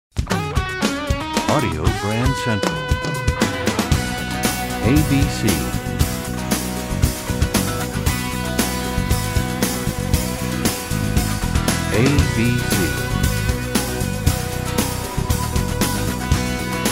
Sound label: Desert Wind, radio jingles